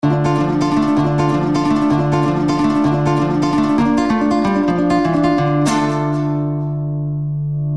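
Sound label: krasivoe sms na gitare, beautiful sms on guitar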